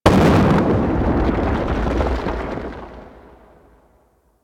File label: Mining Explosions, Asteroid; Ambience; Warframe; Grineer Asteroid